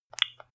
landline click

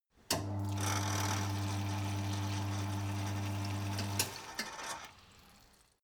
mechanic sink water running long

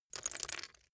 glass debris fall single short

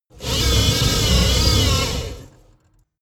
servo large

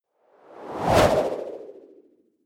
whoosh impact soft wobble high

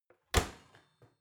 oven close

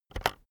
keyboard external enter press very fast

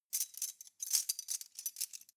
cutlery teaspoons handle

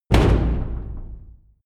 large damper

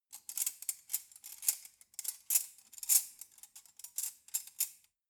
cutlery forks handling long

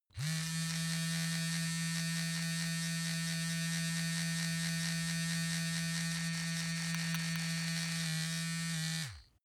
razor smooth strained

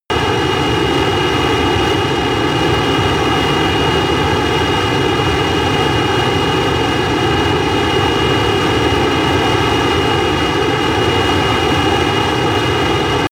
military jet fighter steady noise

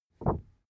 cardboard wobble short medium

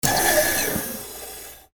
hydraulic door

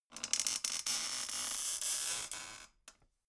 folding chair squeak creak

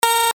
control beep

